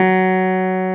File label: CLAV F#, CLAV PIANET; Sonic Reality; Vintage Timetraveler